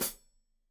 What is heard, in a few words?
Hat Foot, Hi-Hat, Cymbals Stereo